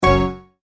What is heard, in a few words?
multimedia tone end; McKinney Sound